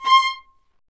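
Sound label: Marcato, Strings; Vlas Marcato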